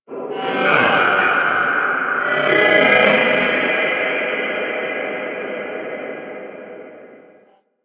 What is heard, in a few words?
Cephalon Tech Medium, Ambience